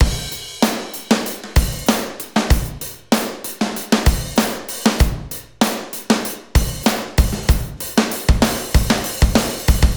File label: 96bpm fill